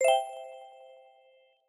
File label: submission Success